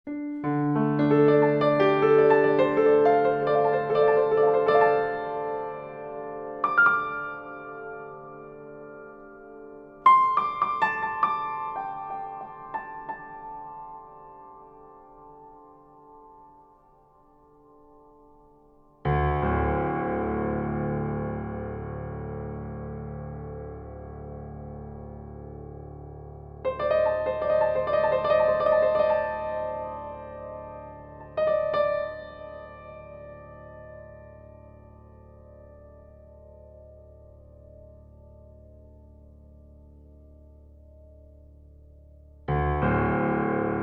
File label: peace mind